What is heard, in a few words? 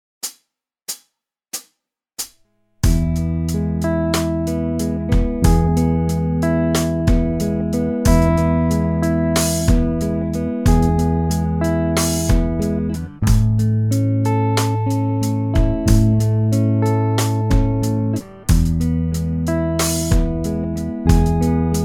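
silent acoustic; xmas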